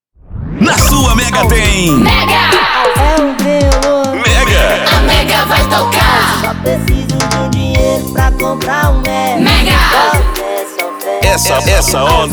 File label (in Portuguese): INTRO MUSIC; MEGA FM